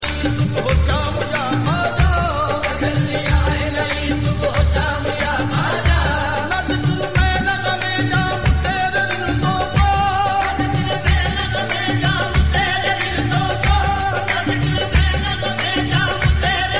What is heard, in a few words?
Qawwali